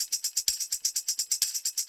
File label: Percussion Loops, Shaker & Tamb; Houseworx